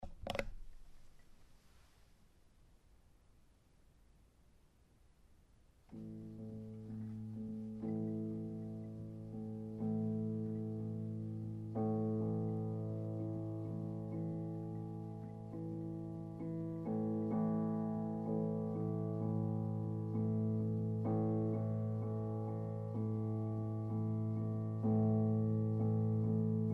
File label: Atmospheric Drone